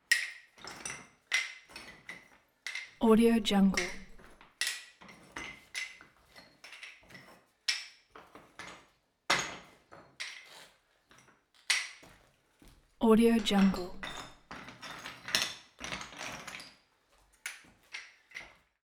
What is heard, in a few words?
picking up tile